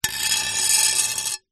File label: impacts glass small pane sheet thin scrape on ground